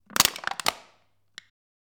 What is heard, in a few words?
Can Crushing